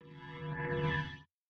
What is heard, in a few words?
breakbeat